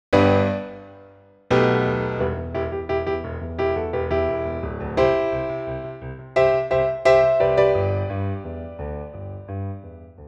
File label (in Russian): RNR рояль заплатка припев